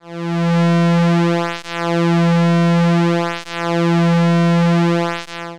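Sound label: Pulse Width Modulation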